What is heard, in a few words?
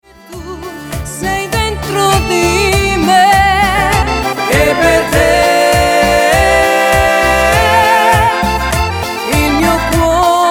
Cumbia